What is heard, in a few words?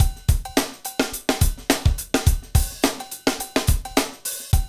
Hip Hop, Drumscapes Roland; Northstar